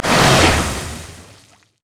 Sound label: surging tides; nami base